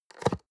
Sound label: box small close hard; industrie wirtschaft; bueroarbeit